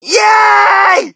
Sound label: crowd, shout; Mike Tyson Heavyweight Boxing